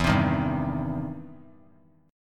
E Minor Major 7th Sharp 5th, E, down; EmM7#5 strummed

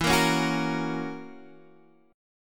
F sharp Major E; F#/E Chord {X 7 8 6 7 6} strummed

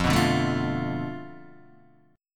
F 6th Flat 5th F down Guitar DADGAD, chord

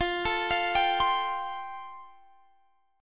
F Major Add 9th Guitar DADGAD; down; chord shape

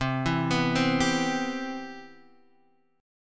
C Major 7th, Guitar Half Step Down; CM7

